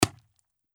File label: impact squeeky